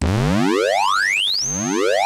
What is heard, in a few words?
JUNO 60 G2